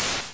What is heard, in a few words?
dash; PinmikPanik